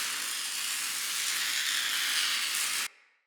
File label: Spear Projectile Loop; Enemy; Combat; Characters; Corrupted Sproutfolk